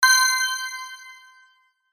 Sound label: chime bright; Chimes (Sound Effects:Multimedia)